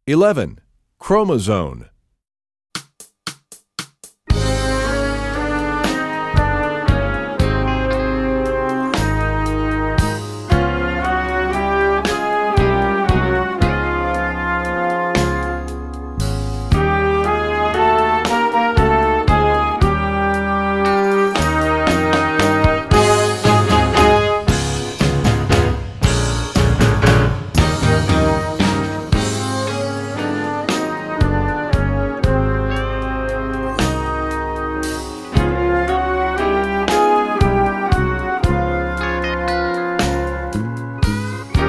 trombone; essential elements

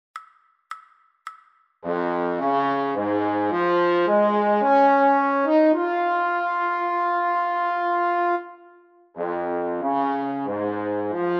slow, trombone

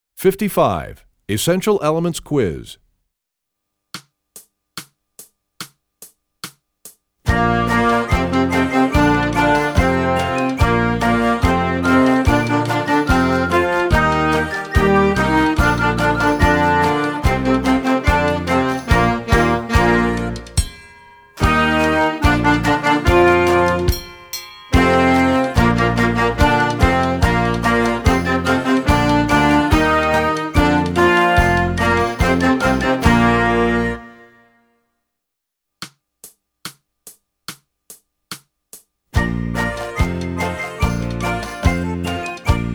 trombone; essential elements

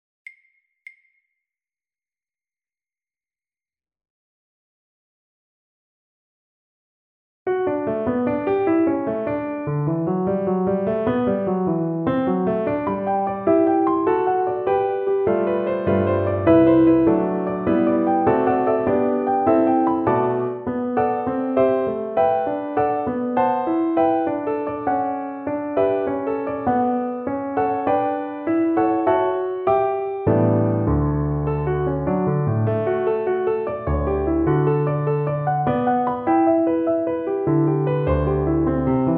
Piano

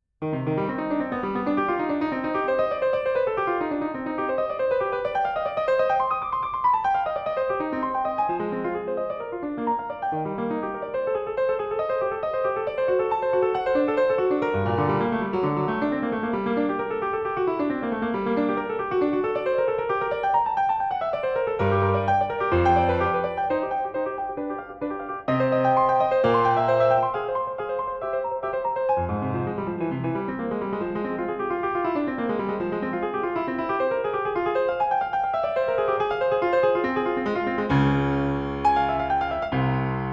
Solfeggietto, Piano; Bach CPE

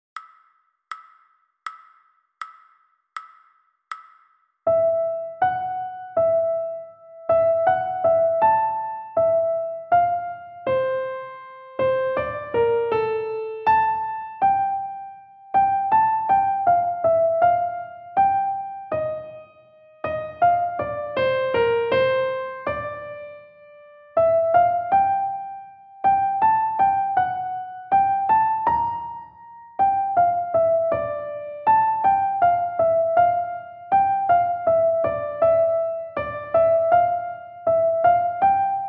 Two Studies - slow, recorder; Linde

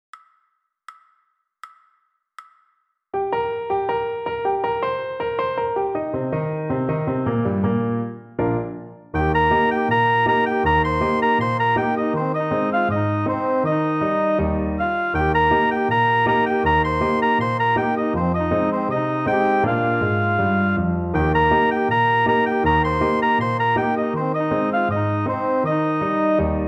trumpet

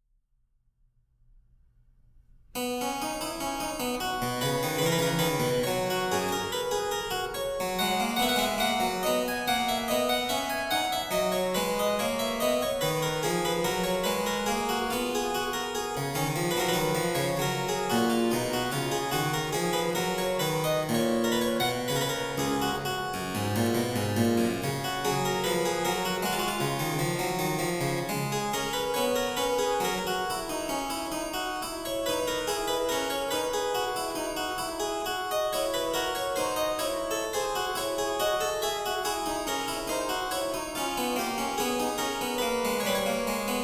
Invention Harpsichord; Bach; Invention No. 01; BWV 772

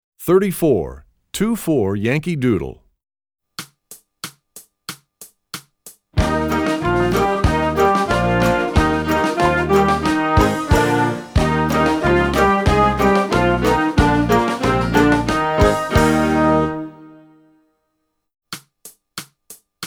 Two-Four Yankee Doodle, trombone; essential elements

